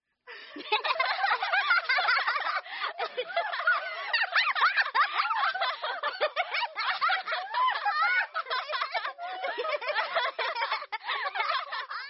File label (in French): rires